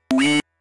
wee

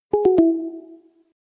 google voice disconnect

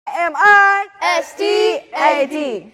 chant; ami stad

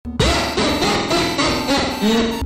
laugh

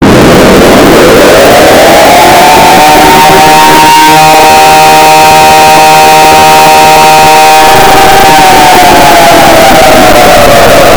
antarctica eas bassed up

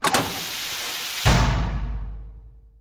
door close

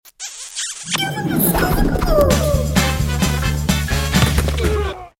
scoot boot

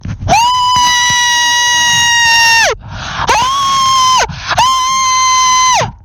scream

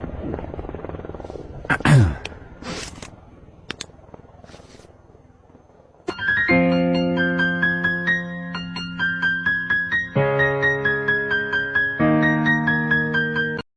recap music

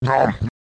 nom

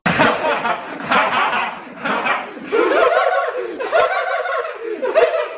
possess laughter

